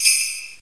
soft hit finish; JesusOmega {NM} 『Planets』

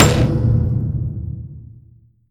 taiko soft hit finish; Crimson Stella

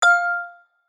match ready; KW-! If there was an endpoint

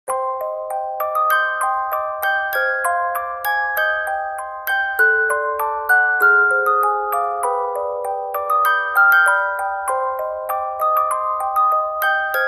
pause loop; Aireu (megahello)

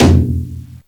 taiko normal hit finish; WMountain 3D Skin

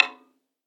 taiko soft hit clap; Crimson Stella

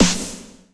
drum hit whistle; WhiteCat (1.0)

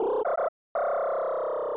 section fail; Crimson Stella

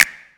soft hit normal; JesusOmega; Planets

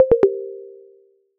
section fail; MarkMoon

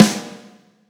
drum hit clap; izduh v4 blue simple

